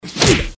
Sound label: fist hit seq